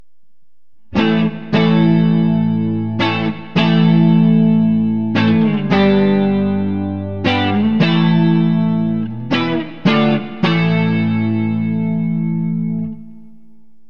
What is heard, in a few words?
neck clean; burny